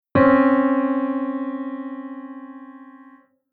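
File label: Chord Interval Minor 2nd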